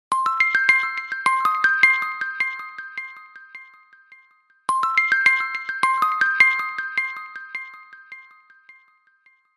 Oppo Reno 5

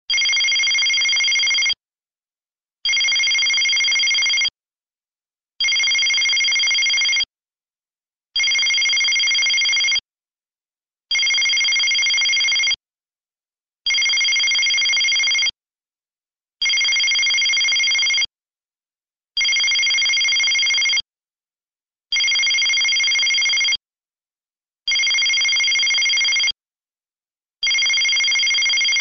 Nextel i450

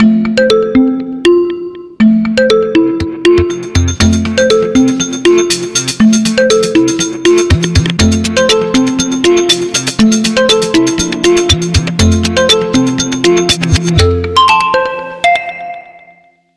Oppo K9x

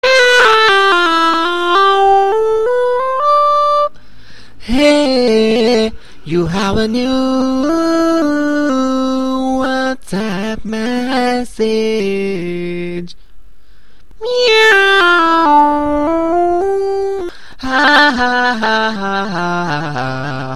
autotune; Whatsapp

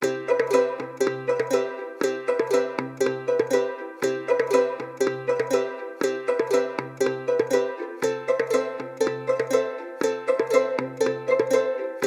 Huawei Y6

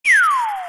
LG laser

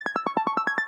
iPhone XS Stargaze

